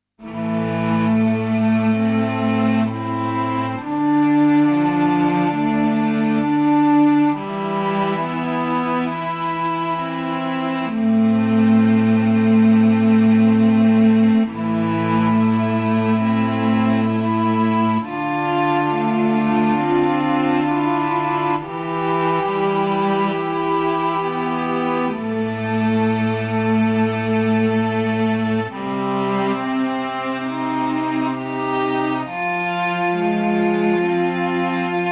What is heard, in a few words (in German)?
streicher